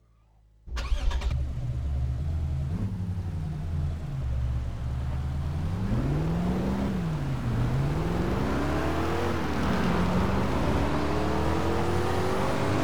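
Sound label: lexus ls 600h l, car; TDU-1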